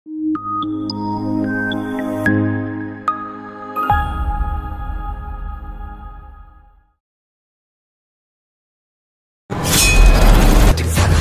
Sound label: trailer bgm